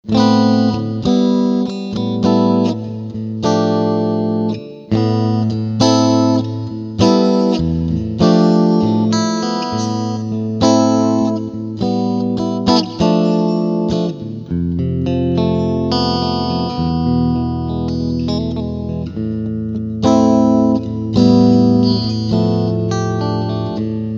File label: Picking Singlecoil